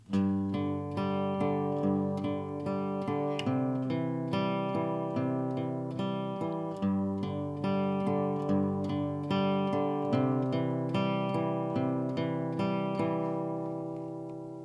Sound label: Picked pattern, guitar